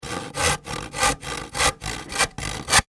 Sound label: Industry Saw Wood Handsaw; Saws; Machines